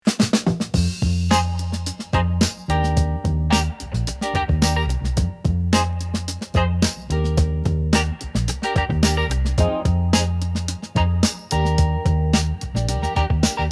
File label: Retro cool golden groove